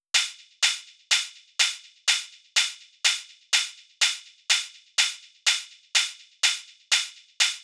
percussion hihat techno

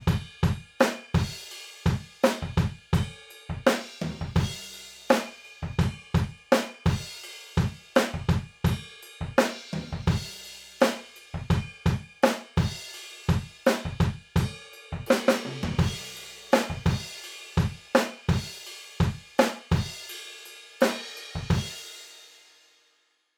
Drums Room

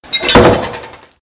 door closing; doorSounds

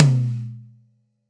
Tom L, Live Trip Hop Drums; Drum Samples; Smoke Signal

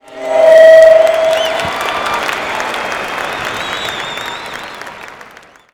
crowds

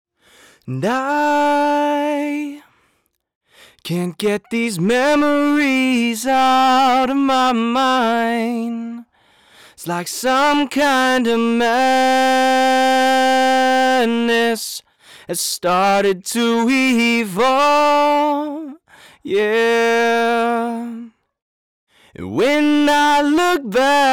Clipped

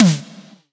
Snare, Synthwave; Drum Samples; BurningPlain; Futurism; Synthwave Loops

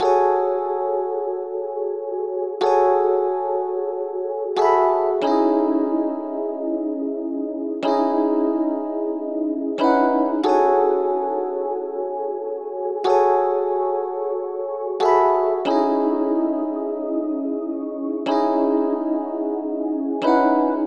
Fm to Cm rhodes loop; Electric Piano; keep up; Electric Piano Rhoads; Various Keys

